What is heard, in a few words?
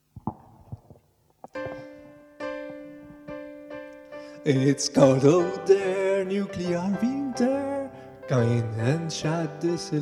song bunny sing